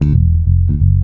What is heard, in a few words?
dub walk, bass, FX loops; Spectrasonics; Bass Legends